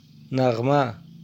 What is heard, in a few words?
melody